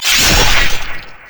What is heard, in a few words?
crit attack; LoL; yasuo base